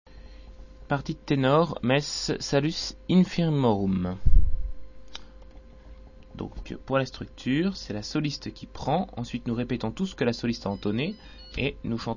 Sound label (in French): Messe Monteverdi kyrie tenors